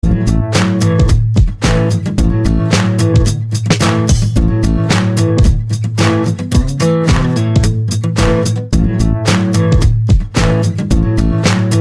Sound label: Country Music